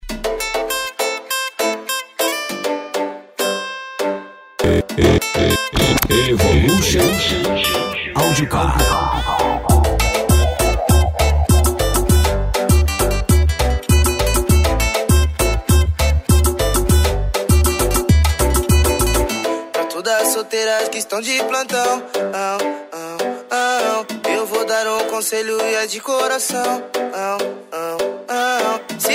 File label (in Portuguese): funk evolution audio car